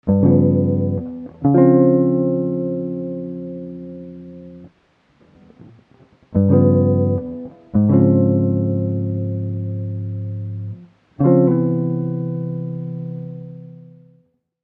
S Guitar

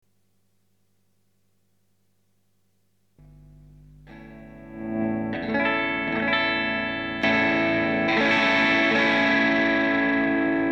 YAMAHA OD-01 OVER DRIVE, CLEN DRIVE; OUT LEVEL4 TONE5; DRIVE6

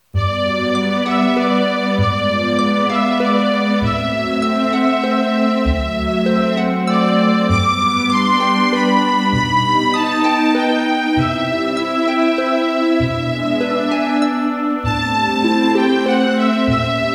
strings

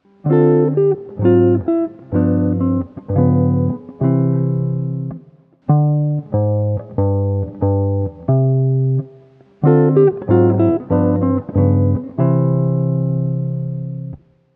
S Guitar

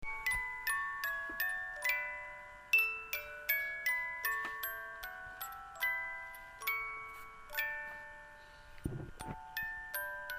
musical box

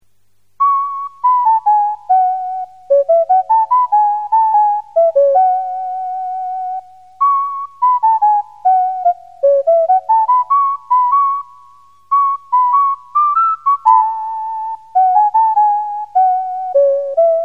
keybd

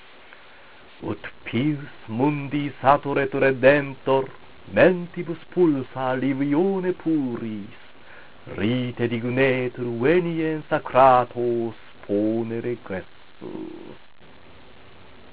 Utqueant, latin

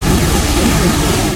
fire, sound effect

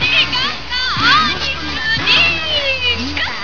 icerink

